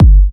perfect bass drum single shot g key

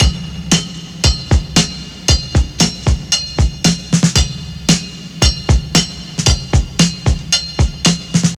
115 bpm drum beat c key, kick tuned to the C note

